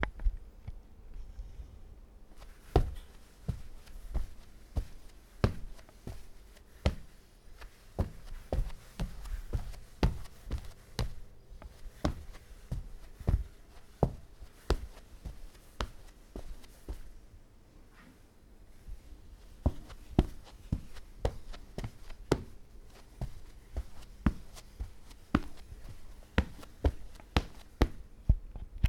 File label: Walk On Carpet